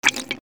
electrical switch

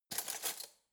cutlery spoon drawer grab hard

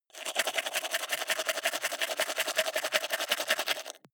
teeth brush wet open inward fast

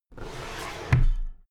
drawer cabinet paper close heavy

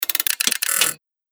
clockwork winding up

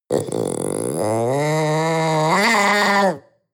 panther young cry

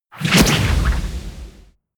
swoosh

